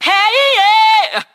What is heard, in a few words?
"hey eee yeah" SUNG; ooh ahh